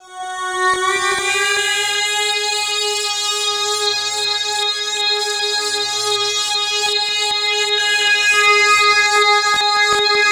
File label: Keyboard